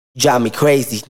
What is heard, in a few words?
Acapella